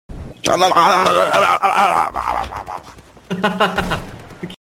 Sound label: aarguarhuragarhuarg